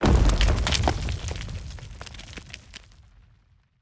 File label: Environment Wall Break